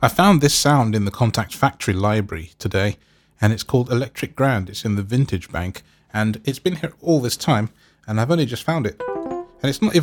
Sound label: Electric Grand; Kontakt Factory Library